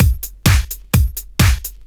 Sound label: Clubhouse Loop 128 BPM